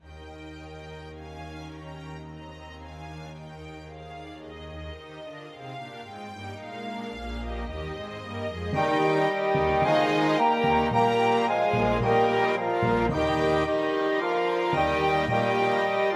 orchestra

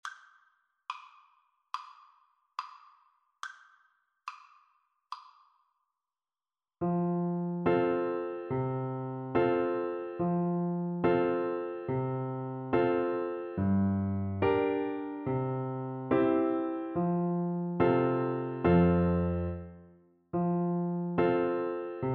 de laredo, French Horn

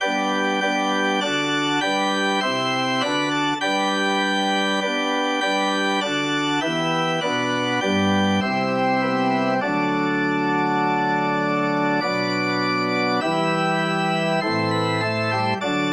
organ

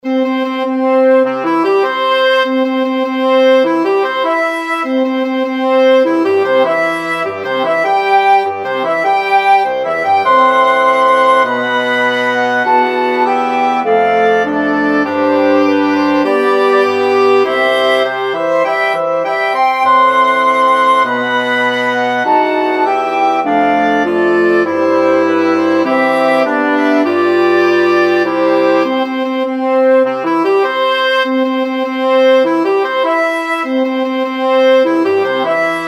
wedding march, wind quintet

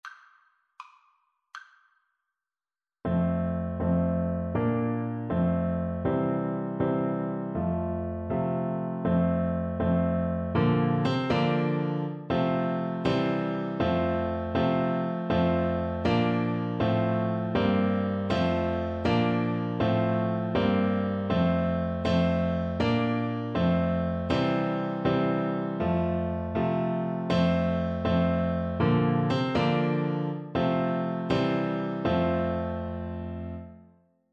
for hes a jolly good fellow, Euphonium

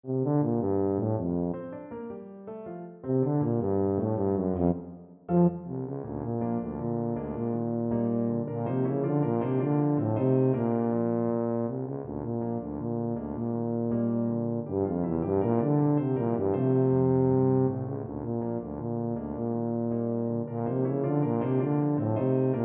tuba

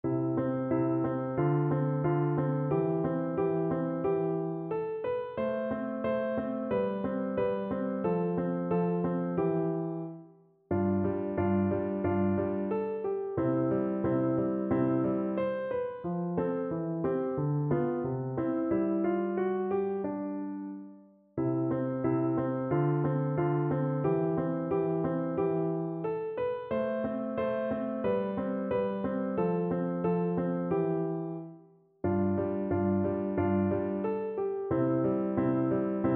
andantino, piano; carulli

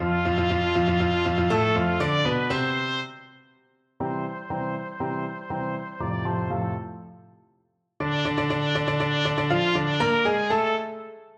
trumpet

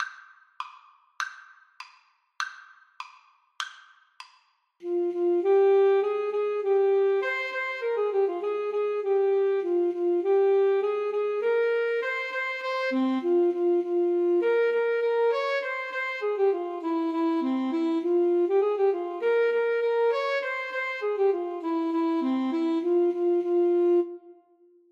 Alto Saxophone Duet

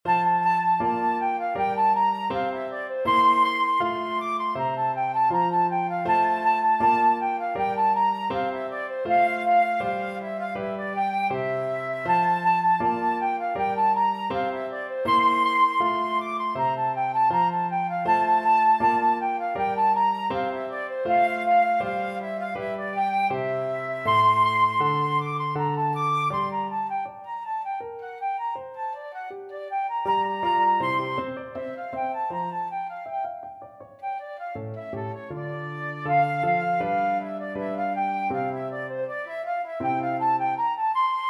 gavotte cello suite, flute

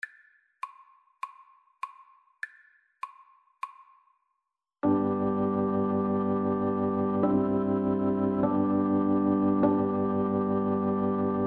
Recorder

